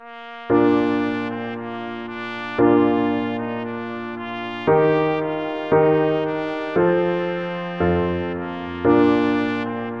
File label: at the cross trumpet